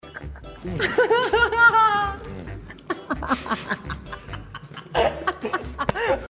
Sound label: laugh; JungKook